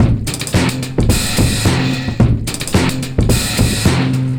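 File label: DRUM 109, HITBREAK 109, Breakbeat; Best Service ProSamples vol.24; AKAI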